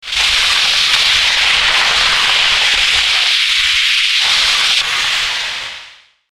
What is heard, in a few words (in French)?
Glisse, Ski